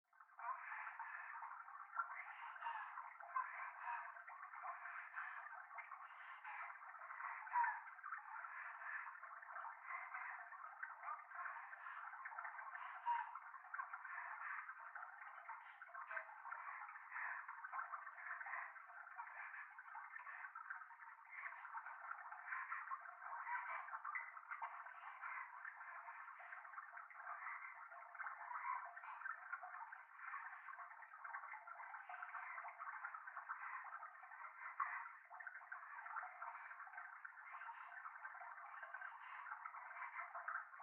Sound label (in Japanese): トイレ 右耳密着処女喘ぎ 川のせせらぎ